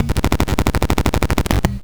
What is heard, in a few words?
grind, EMU XL-7